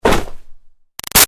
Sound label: Hockey Hit Body Check Flam; Sports; Big Room Sound